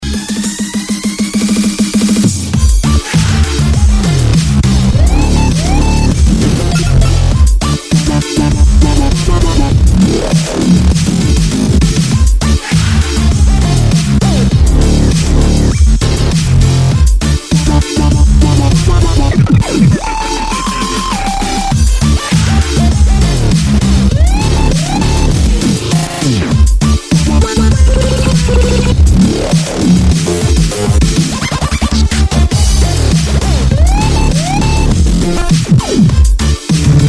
gaming hard

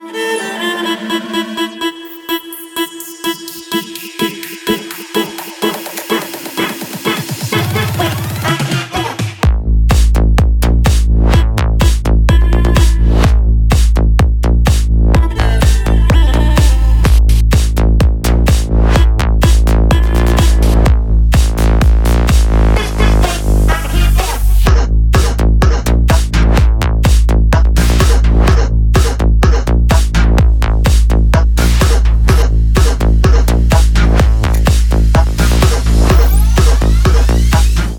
violins

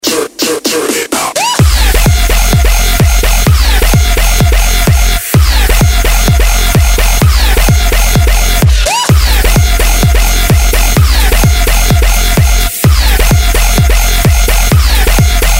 dutch house

